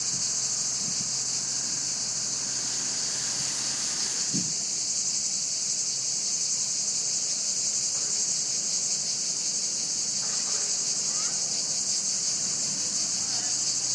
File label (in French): cigales